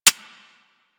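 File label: submachine gun Mech; Warframe; Tenno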